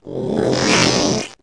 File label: zerg drone; 星际争霸音效; 虫族